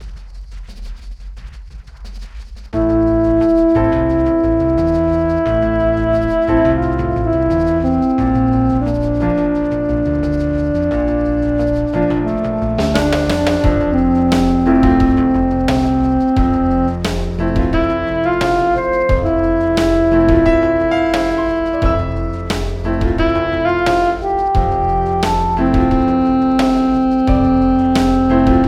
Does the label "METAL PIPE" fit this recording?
No